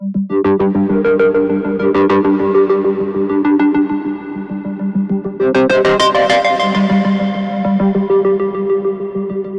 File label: Musical Loop 100 Synth Lead G Minor; Ghosthack